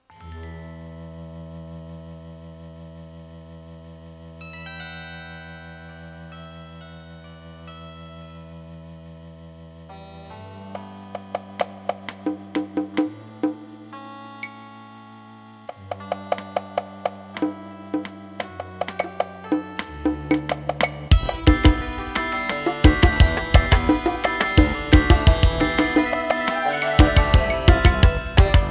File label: SC-55MkII half malfunction; via CT2800 gameport and SoftMPU after SysEx Delay Enabled; MT-32